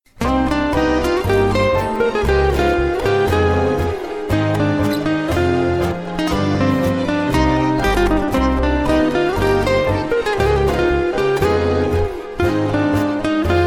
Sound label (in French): tango musette